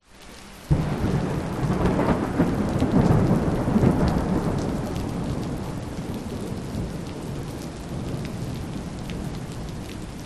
rain medium on street with big thunders distant noise kuala lumpur malaysia; lukas tvrdon